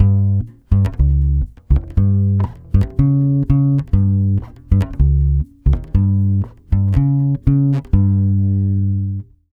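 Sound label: 120 bass G diminished, brazil bossa; USB Soundscan; AKAI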